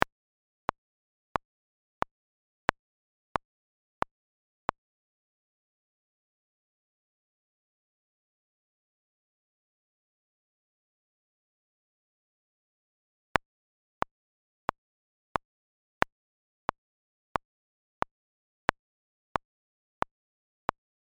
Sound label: Metronome with three bar gap @90